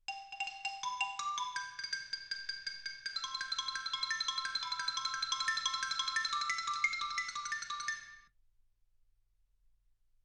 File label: xylophone